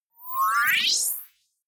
screen turn on bubble; energie

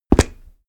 step stone step; schritte

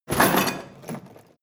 crash medium chain smash

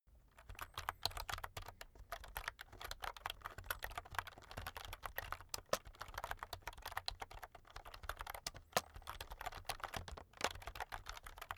keyboard external type sequence fast soft; kommunikation; computer

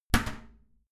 toilet lid slam heavy; haus-garten; badezimmer

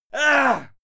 male fight attack; soundpool

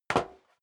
step sneaker metal dry single stair up; schritte

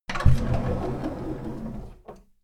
sliding door

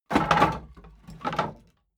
wood board drop rumble; objekte; holz

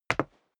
step dress boots wood parquet single stair up; schritte; menschen

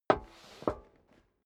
step dress boots metal rattle scuff; schritte; Footsteps; menschen

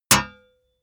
metal impact sword plate

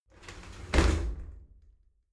shower slide doors close heavy; objekte; tueren

